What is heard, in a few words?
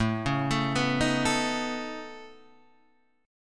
C sharp 7th Suspended 2nd Sharp 5th A